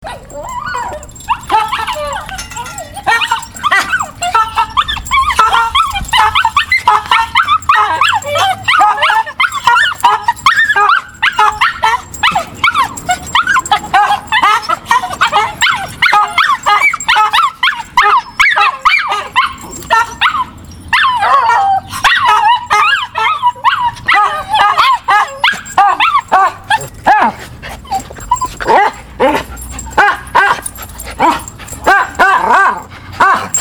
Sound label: bark, barking dog; roxie